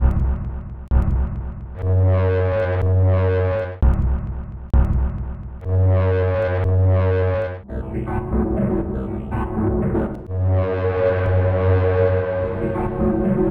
sine wave

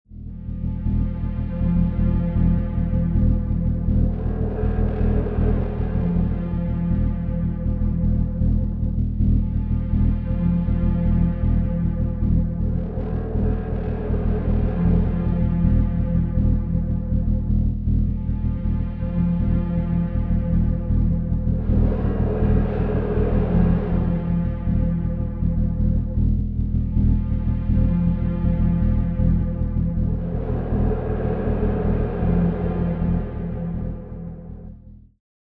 Sinwave

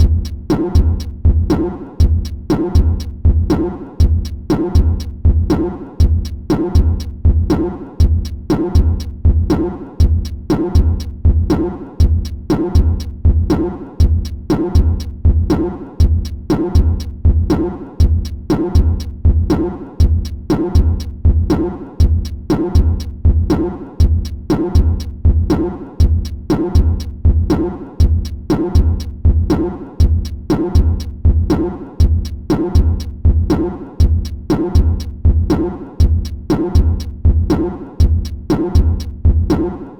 sine wave beat

